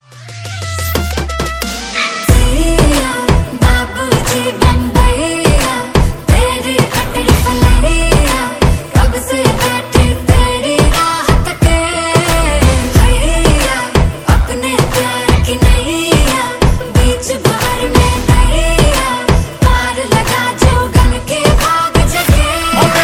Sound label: hindi bollywood, ringtone